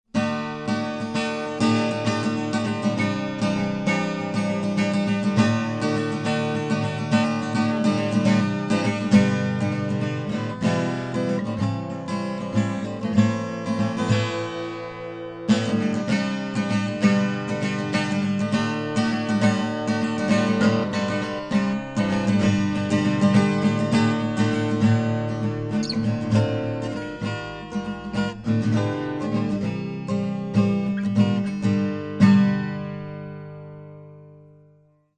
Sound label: Western, western guitar; Maestro Western; western guitar 41